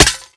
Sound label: glass; Mafie 1